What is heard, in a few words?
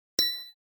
Chime Button Beep - Thin; Hollywood Edge; sound designer's toolkit v2